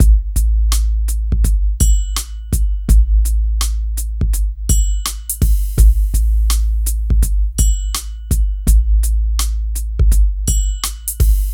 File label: DRM, Hip Hop and R&B Drumloops